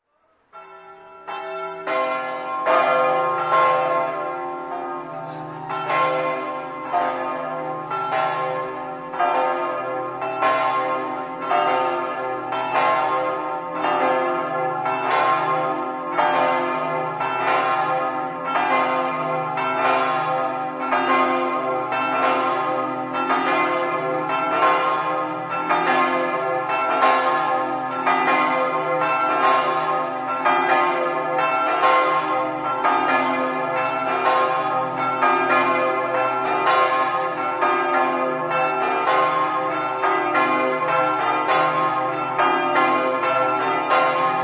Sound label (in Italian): mariano, campane